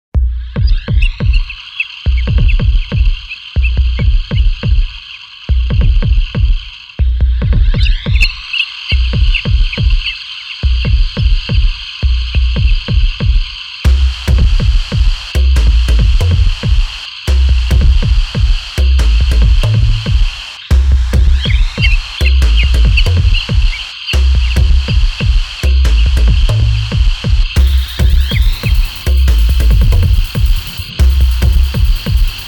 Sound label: Rainsticks